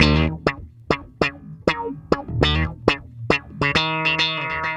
Bass Loop, Ambient Grooves

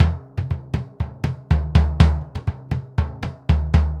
BOMBO, MERENGUE; Best Service ProSamples vol.14; AKAI

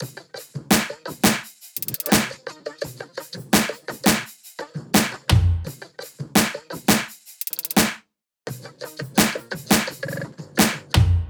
loop top 85, Seismic Loops

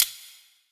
Kitchen Foley FX Drums; Antidote Advent; Kitchen Foley Sounds & Loops

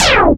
Zap C3; Zero-G; Club Techno

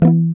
GUI E2, Acoustic, Roland

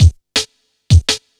165, drum loops, drum & bass

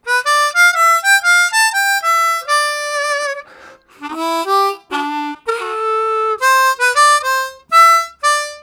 Steps, Harmonica Riffs; Zero G

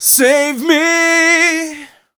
m 127 mel a, Vocals

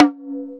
EQ Perc, Percussion Mix

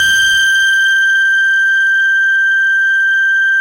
CELLU G5, Yamaha VP-1; Music Store; 90's Sample CDs; CELLULOID

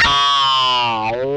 whammy fx, guitar

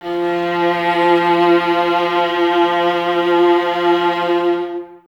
violas F 3, String Ensembles; Miroslav Vitous; VLS 1+2

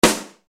EFF. SD, Yamaha RY-30

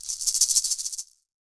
PUILI, HAWAII PERC; AKAI S6000 CD-ROM; WorldSounds

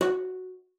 CELLO FINGER F#4; Xsample VOL-5

